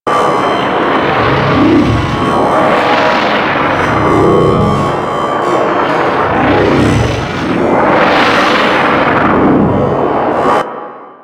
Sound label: Energy Core Close Loop; Warframe; TheNewWar; Ambience; Cetus; UnumTower